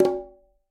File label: Quinto C Flam Opn, Conga; Latin Percussion; Drums; Drum Hits; Ableton; Factory Packs